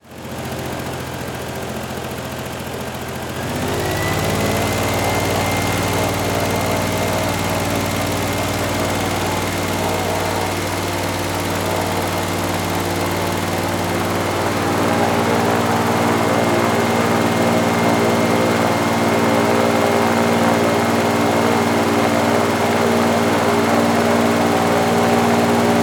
Air Boat Engine; Blastwave FX

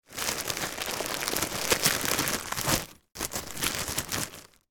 bread loaf in bag handling

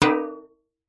impact metal light hit cooking tin water inside

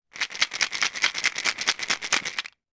household vitamin tablets shake inside container

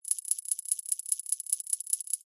game sound coin count

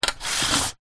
industrial joist metal small sliding extend

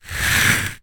nature ice block slide on ice; zapsplat

